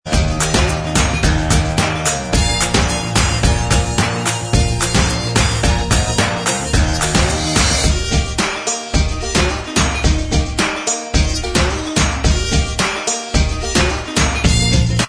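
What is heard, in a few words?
instrumental, backing track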